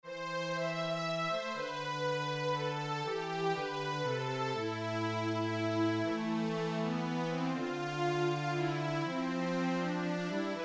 Valse; de l’Aber

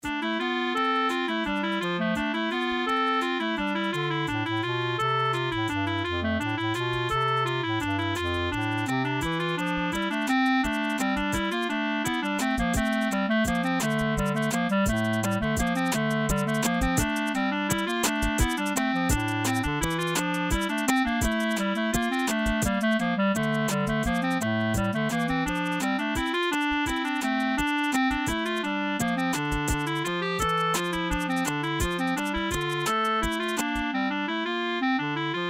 Ridée; cousue main

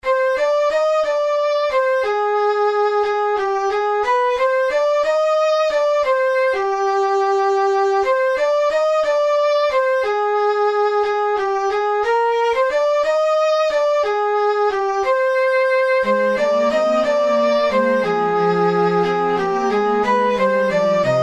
Valse Gulf Stream

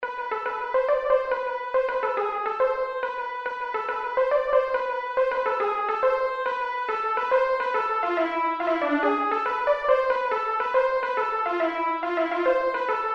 Gavotte de Trégunc

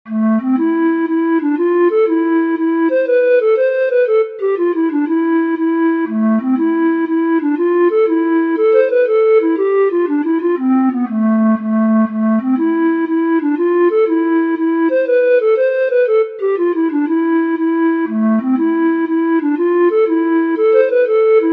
Mazurka de la pluie

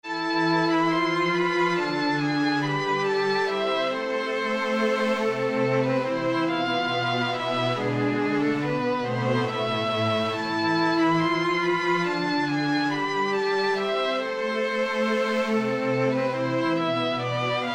Valse San Ti Men

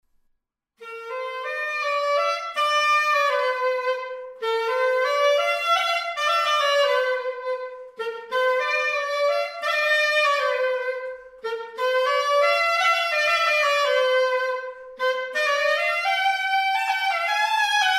Marche du pays Plin pour clarinette 13 clefs

